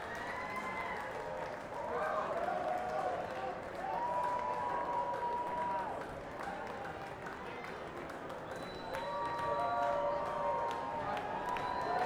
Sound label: Encore Break